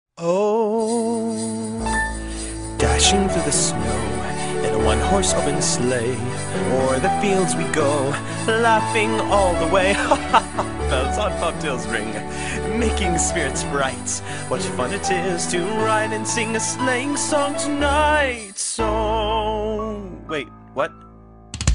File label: Jingle Bells